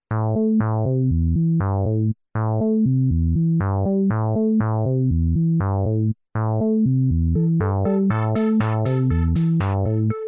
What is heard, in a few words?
Mod Groove